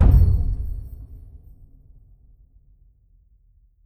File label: ui skills stop; perks